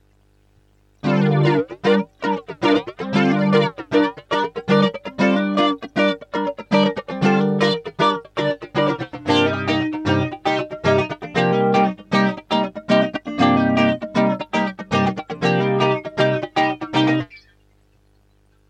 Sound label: Funky Chops, flange